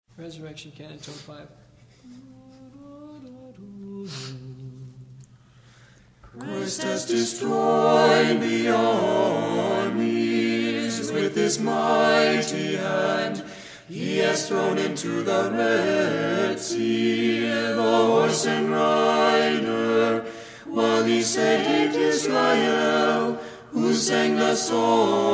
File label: Canon Kieven Tone 5